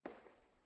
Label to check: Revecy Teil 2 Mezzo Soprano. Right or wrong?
Wrong